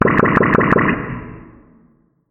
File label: bubble attack, magic